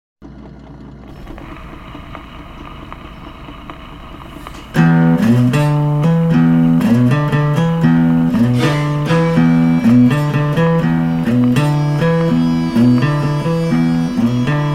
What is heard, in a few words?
40's Blues; Soundzabound Volume 3 Disc 1